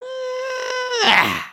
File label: hat dance, emotes